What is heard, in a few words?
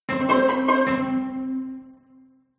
MUSICAL ORCHESTRA Jingle Strings Pizzicato High; smartsound